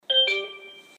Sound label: tweet